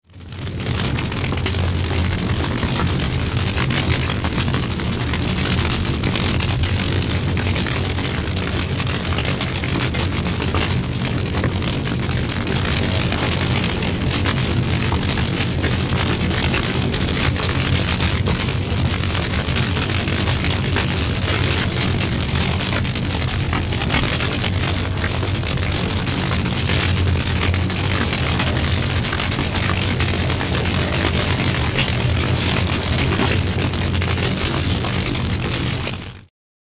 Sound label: Large Wood Fire